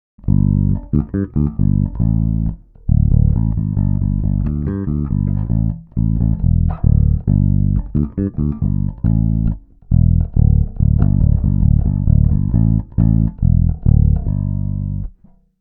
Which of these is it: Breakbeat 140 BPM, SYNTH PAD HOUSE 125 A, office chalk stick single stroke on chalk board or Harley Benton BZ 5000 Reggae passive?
Harley Benton BZ 5000 Reggae passive